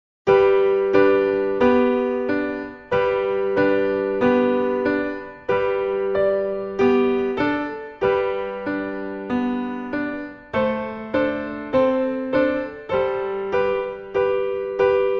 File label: Jingle Bells